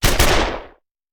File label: Machine Gun; Sound Ideas; Guns